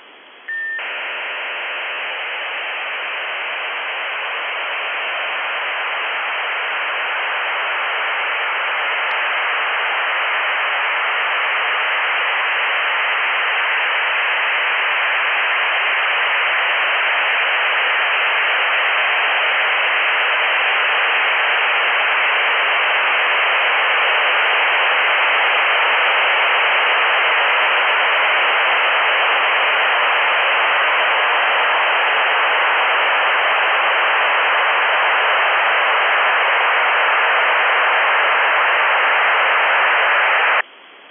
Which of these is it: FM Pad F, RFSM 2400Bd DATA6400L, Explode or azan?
RFSM 2400Bd DATA6400L